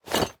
equip iron, armor; Minecraft

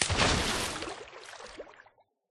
splash, dolphin; mob; Minecraft

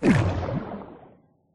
whirlpool inside, bubble column; Minecraft; block

